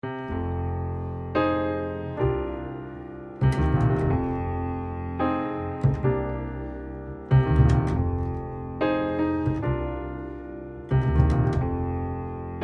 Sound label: Blues For a Bass Player